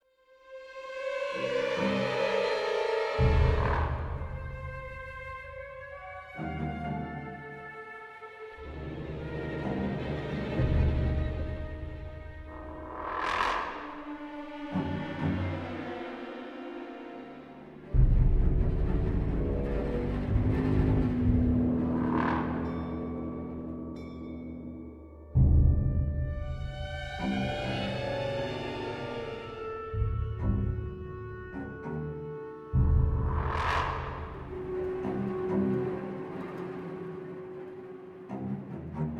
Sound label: Suspense; LittleBigPlanet 3; PlayStation 3